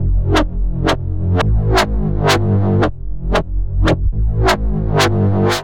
Fuzz Step F Minor 170 bpm, Bassline; MIDI Clips; Tonal; Skitter and Step; Ableton Factory Packs

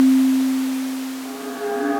Gust; Inspired by Nature by Dillon Bastan; Tree Tone Presets

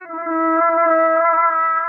Poly Synth; Play Keys; Ableton; Factory Packs; Inspired by Nature by Dillon Bastan; Emit Presets

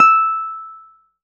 Keyboards E5; Ableton Foundation FreePacks; Model-C BC

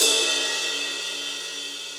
Ride ResoClassic; Drum Hits; Ableton; Factory Packs; Session Drums Club; MPE Hits

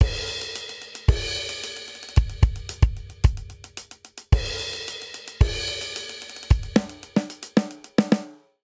Classic Disco 07 Outro 111 bpm, drum; 4 4 111 bpm Classic Disco

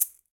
Shaker, Latin Percussion; Drums; Drum Hits; Ableton; Factory Packs

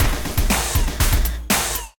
Scorched Kit, Drums; Ableton; Digicussion 2; Scorched Kits

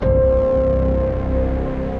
Piano Drop Lead, Soaring Leads; Ableton; Mood Reel

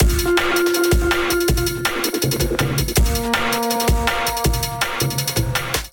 Kit Melodic 162 bpm, Drums, Complex; MIDI Clips; Pho Soup Kit; Ableton; Factory Packs; Skitter and Step

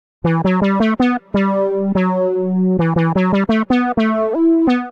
E Minor 87bpm; MIDI Clips; Melodic; Evening Casual; Ableton; Factory Packs; Chop and Swing

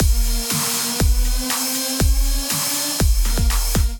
Auto Theft Kit, Drums; Ableton; Build and Drop

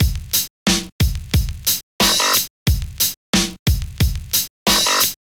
Kit, Drums; Frogger

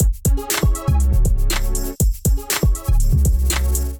Equinox Kit, Drums; Ableton; Beat Tools

